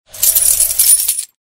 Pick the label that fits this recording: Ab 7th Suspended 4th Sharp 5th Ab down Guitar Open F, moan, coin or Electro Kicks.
coin